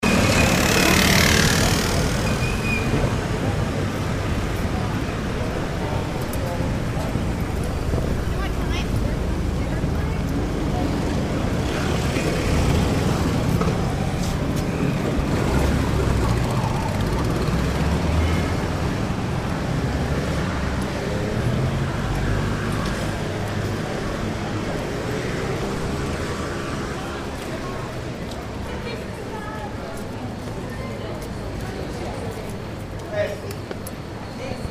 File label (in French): italie firenze strada